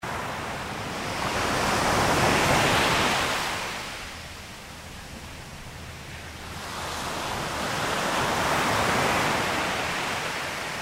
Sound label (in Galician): ondas na praia